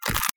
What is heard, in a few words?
Rock scrape